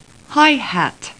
Sound Hat

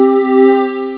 sound, synth chords

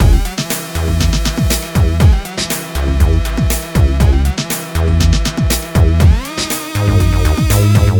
groovebox

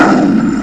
Explode; Querkz 1.5

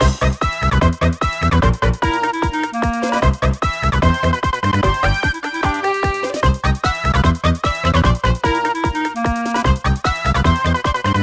theme; LegoRacersDemo

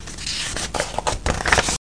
pageturn

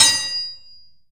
sword; kult

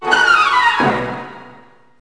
fast cart, music; Wired for Sound Pro CD; Aristosoft, Inc.

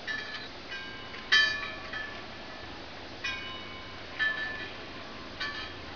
buoy; Image Bonanza

